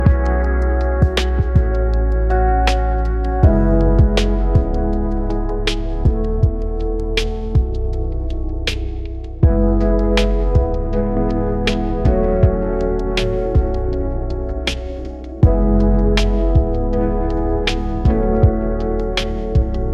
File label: ringtone lofi